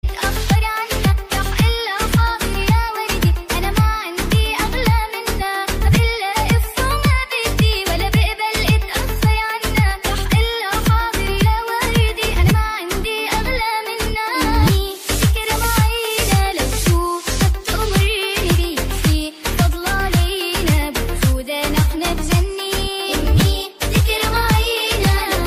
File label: arabian ringtone